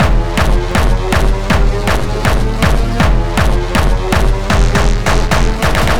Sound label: Beats Loop; Anger Foot Demo; Angerfoot Beats 01